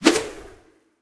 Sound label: Ninja Attack Throw